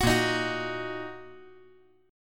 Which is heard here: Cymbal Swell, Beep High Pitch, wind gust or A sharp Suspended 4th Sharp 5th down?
A sharp Suspended 4th Sharp 5th down